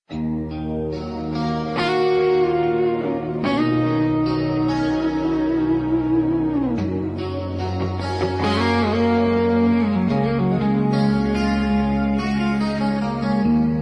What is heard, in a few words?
Quiet Rain - Slow Rock